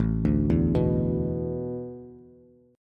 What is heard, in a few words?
A Suspended 2nd Suspended 4th, Bass; Asus2sus4 arpeggiated